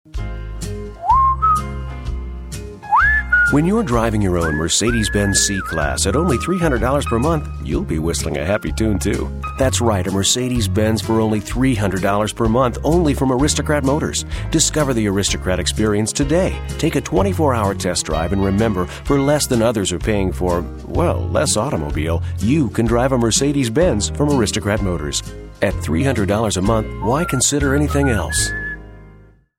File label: whistle; aristo